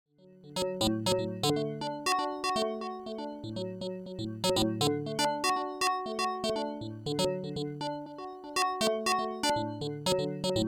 FM Synth clean; Preenfm3

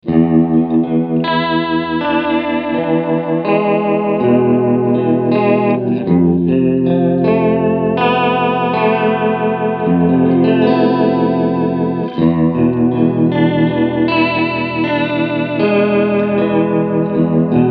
Modulation

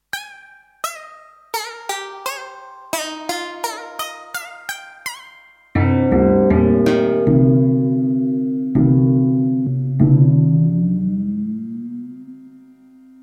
DX7IIFD Temple Gong; Shami

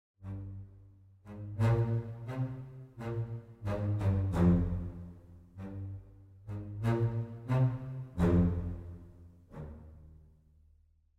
Double Basses Spiccato Fast; Audio Imperia Areia

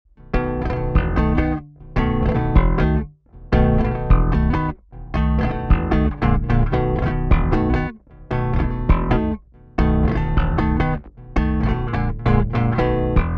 Comp EQ Enh

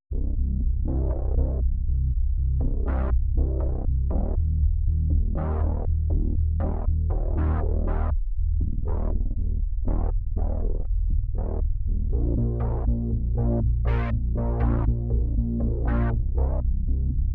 Dystopian Bass; TAL-U-No-LX

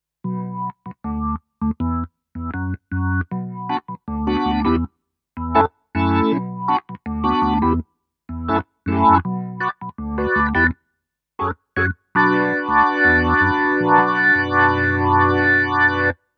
Kurzweil SP6 Organ 1

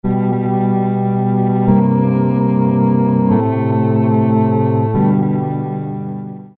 woodw chords

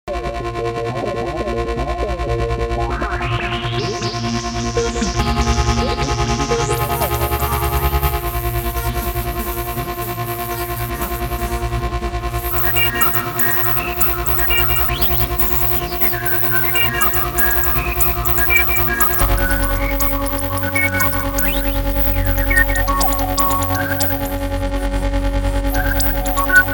Filter Check; MX61

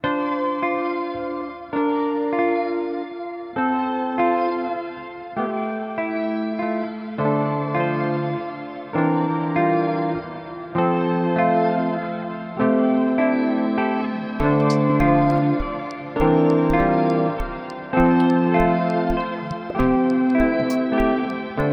Astral Destiny Shimmer; Earthquaker Devices

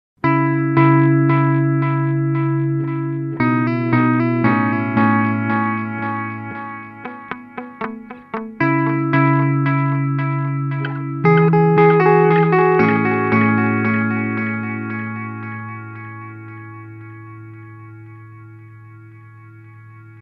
Belle Epoch Bright Setting; Catalinbread

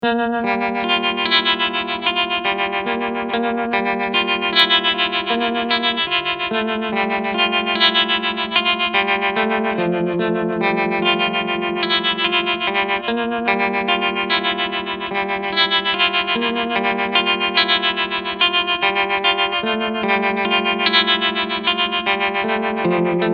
SurfyTrem Tremolo Brown Face; Regler 15 Uhr

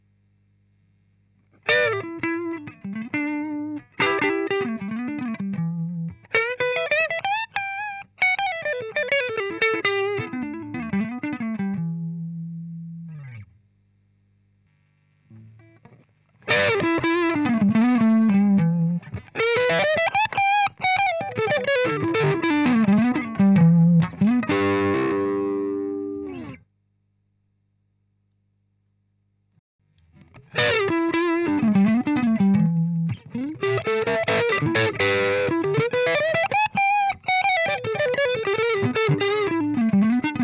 KATANA CH1 Lead; BRUNETTI

